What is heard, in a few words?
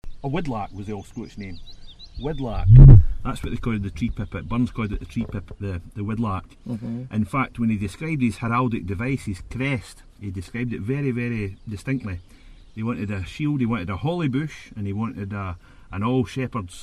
wood lark